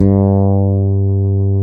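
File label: rock fretless G3; Drums In Your FACE!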